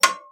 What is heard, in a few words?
Clink